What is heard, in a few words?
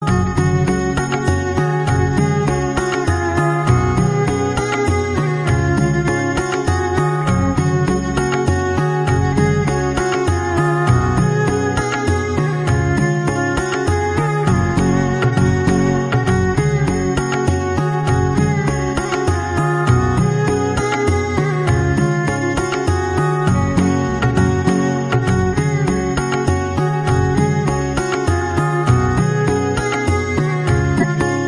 INSTRUMENTAL RINGTONE